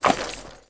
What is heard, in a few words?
male mud, footstep